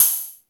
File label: Beat Box, E-MU Pro-Cussion; drum machine